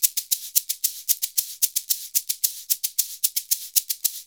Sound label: SHK, Shaker; Univers Sons; Basicussions